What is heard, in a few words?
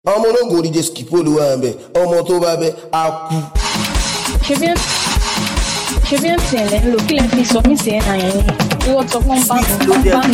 Cruise Beat